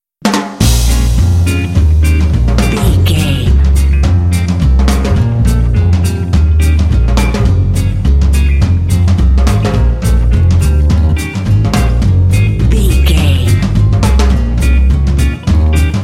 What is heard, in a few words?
jazz django swing bed